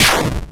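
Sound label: Se Battle Damage; Etrian Odyssey I HD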